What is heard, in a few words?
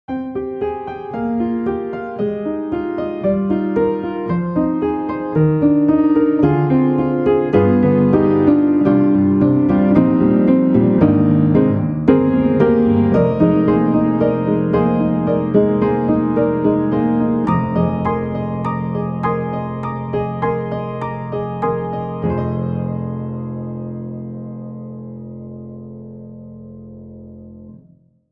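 Upright Mono Dark, piano; Spectrasonics; Keyscape; Wing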